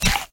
fruit apple; fruitslasher

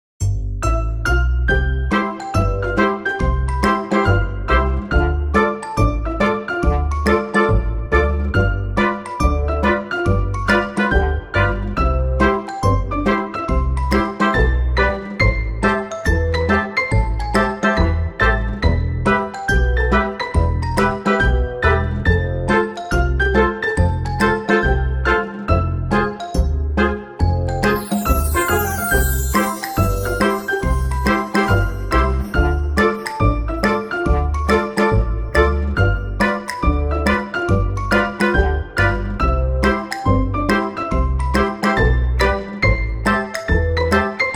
bg music; Cupid-Heart

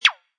shoot cute; Bazooka-Gun-Boy

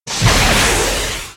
bazooka; Jungle-War

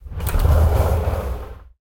open door; Knights-Diamond

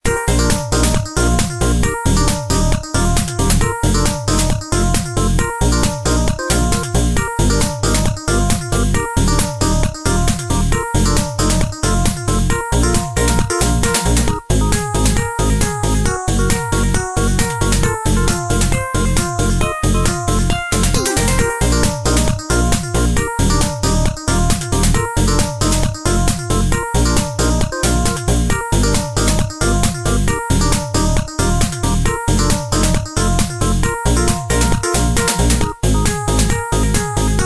music; crazy car

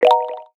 button; crazy runner

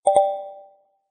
game over; flappy bounce

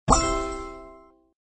match; fish world match

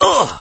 soldier scream; Forest-warrior

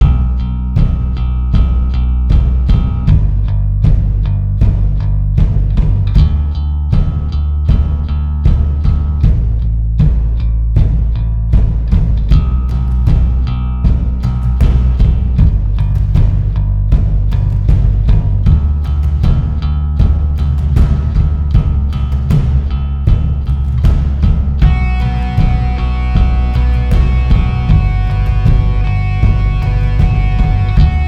bg music; Soldiers Combat

